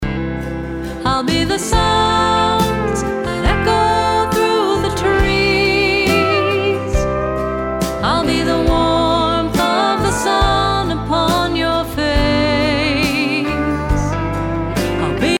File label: SONG